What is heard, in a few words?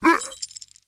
Cyclops Recoil